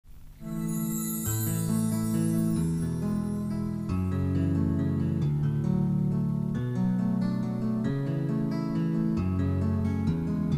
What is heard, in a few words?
Instrumental